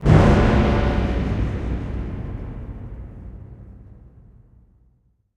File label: Mystery Stinger